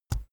keyboard laptop enter push soft; kommunikation; computer

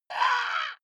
female lunatic scream crow; schreie; menschen

